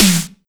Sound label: Snare Alt Sharp